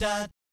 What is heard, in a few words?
DAT G#3, Jazz FX; Spectrasonics; Vocal Planet; JAZZ COMBO